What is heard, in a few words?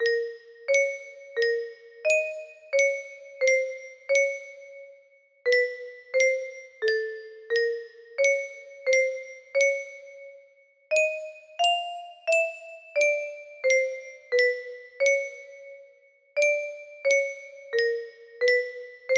simple spooky melody